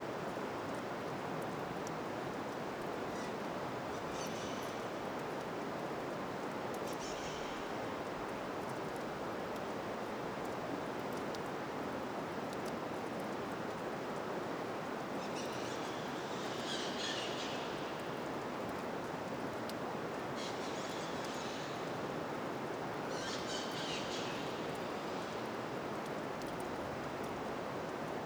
Gotejamento e rio longe boca da caverna terra ronca 1, periquitos longe; reverberacao; agua

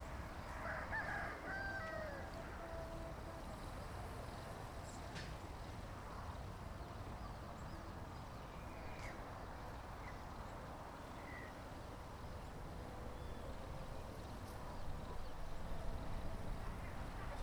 Estrada carro passando com galo, galinhas, pintinhos e musica longe; transito

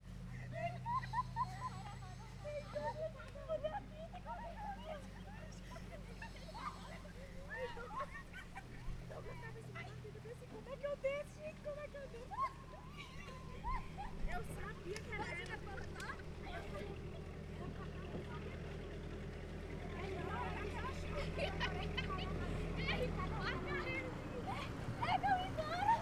Criancas brincando na rua risadas e vozes; multidao; Colecao Sonora do Cerrado

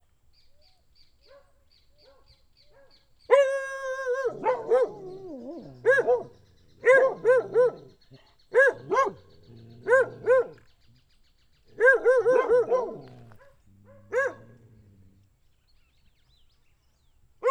Cachorros latindo bem perto com passaros longe; animais; Coleção Sonora do Cerrado

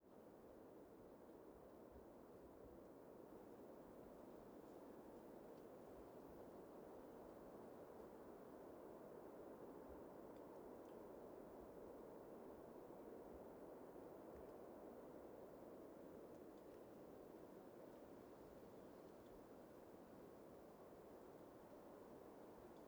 Ambiencia de Manha no Parque Nacional da Chapada dos Veadeiros Som de Vent; mata aberta

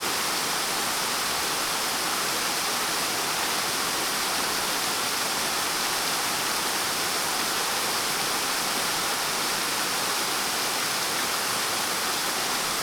Parque terra viva de frente para a cachoeira; agua

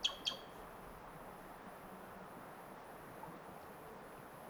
Ave com som de Laser na Beira do Rio; aves

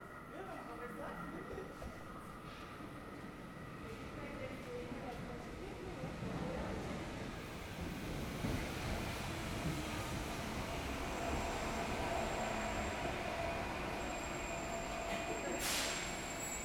Externa de estacao de metro silenciosos algumas vozes metro chegando e indo; embora; ambiencia urbana; Coleção Sonora do Cerrado

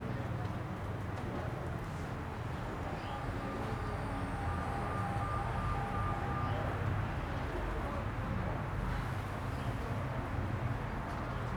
Ambiencia salao com poucas pessoas, muito transito do lado de fora; partida

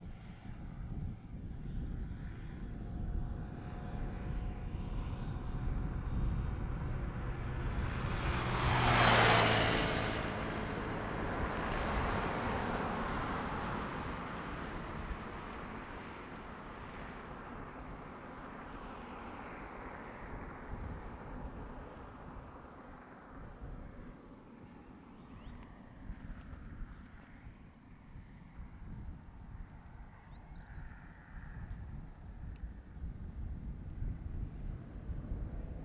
Estrada de sao jorge pouco movimento moto e carro passam rapido com vento; na grama; transito

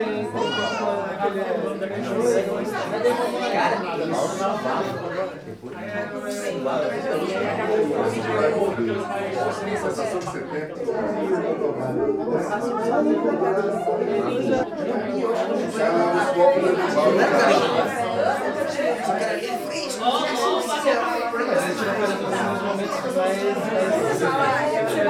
Festa de apartamento com muitas pessoas e gritos de bebe; multidao; Colecao Sonora do Cerrado

